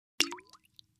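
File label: Splash Small Plop Glass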